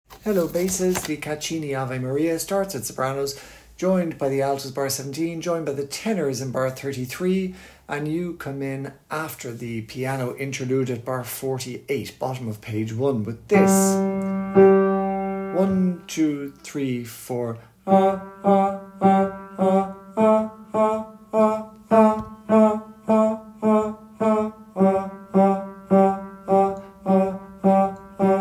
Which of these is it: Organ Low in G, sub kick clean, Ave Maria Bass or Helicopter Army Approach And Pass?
Ave Maria Bass